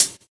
natural hi hat sound f key

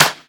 clap single hit f sharp key; single hits one shots

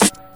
sparkly snare sound g key

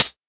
natural hi hat one shot d sharp; hat samples

